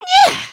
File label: wake up; emotes